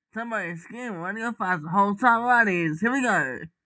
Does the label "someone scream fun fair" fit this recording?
Yes